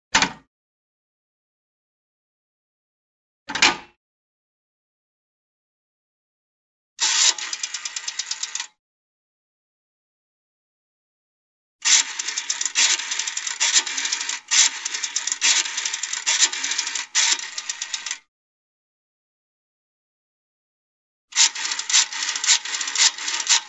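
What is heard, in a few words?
picking up the receiver and dialing a number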